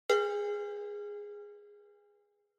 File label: Cowbell (Reverb)